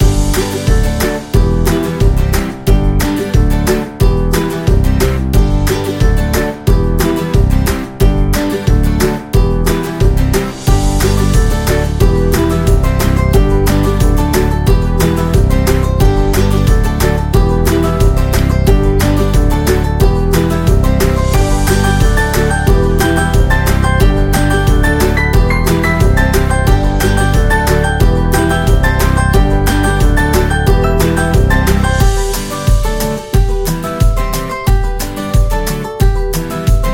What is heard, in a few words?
A Happy Loop, Kids background music filled with joy, laughter, and playful energy